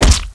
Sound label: Hit, Infected Armored; S-CORE UMSW